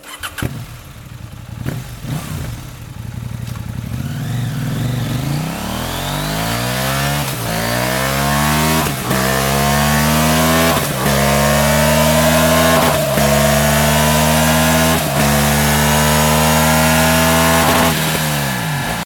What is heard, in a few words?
Sound, LeoVince LV ONE EVO SLIP-ON (EURO 5); 790/890 Adventure 2019-2021; KTM; KTM PowerShop